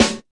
dry snare drum sound D key, acoustic snare sample tuned to the D note; snare drum samples